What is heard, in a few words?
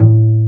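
double BASS D-2; AKAI S6000 CD-ROM - Ultimate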